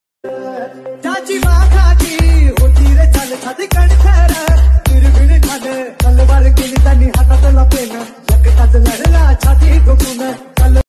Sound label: jay bhim songs; bhim army